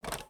Player Button Press; This Is the Police

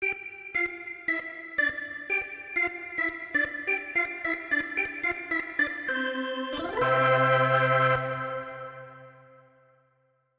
organ

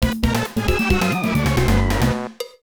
Round Lost; Deroonderodero; arcade

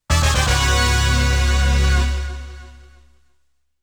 FANFARE II; konami amusement sounds 93 summer; 階級DOWN BGM

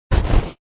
Title Impact

